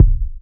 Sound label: clear bass drum sample a sharp key; kick drum samples